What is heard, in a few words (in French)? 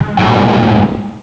Cri